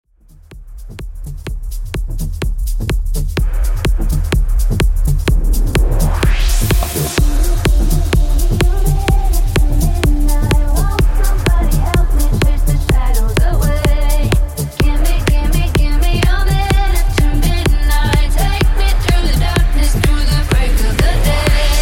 Bass House Bootleg 126 Bpm

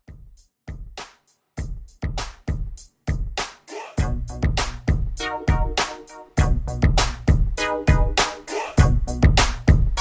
Christmas Re-Drum 100

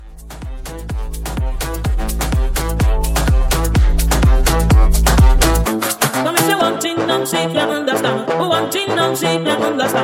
Hybrid Mashup

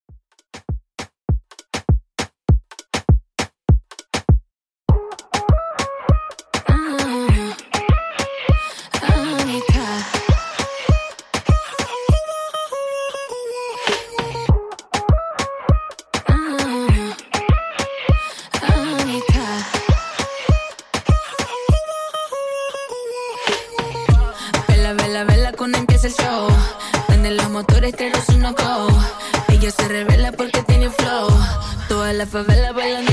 Latin 100Bpm

